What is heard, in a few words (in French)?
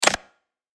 close malette